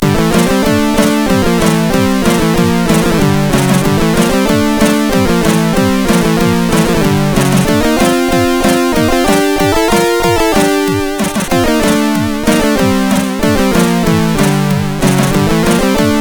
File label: Cover, sid